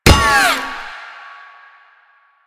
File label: Shield Impact; Warframe; Lasrian; Lasrian Tank